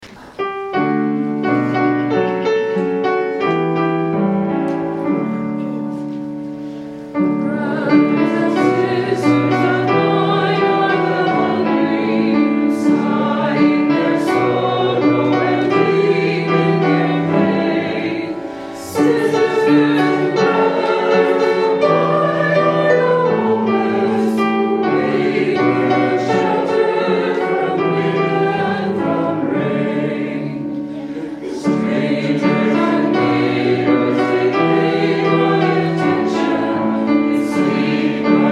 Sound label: Hymn; Brothers and sisters of mine